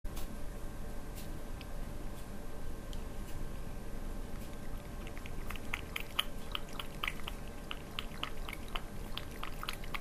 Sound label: cat